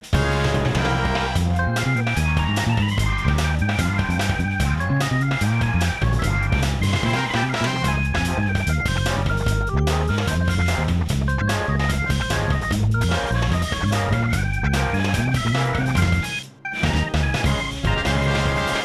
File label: instrumental; chibi robo photo finder